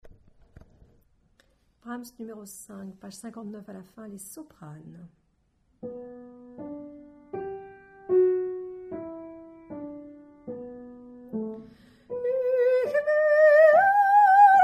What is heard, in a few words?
fin Soprano